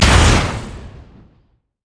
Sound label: heat seeking missile; LoL; urgot